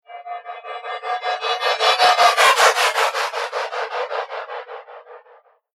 Whoosh Future Distorted Spaceship Evil Glitch Scifi; Sound Design